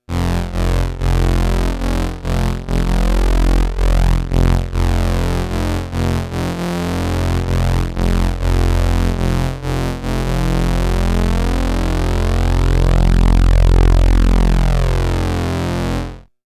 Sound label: OBX Preset